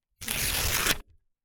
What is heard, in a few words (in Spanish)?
Hoja Rota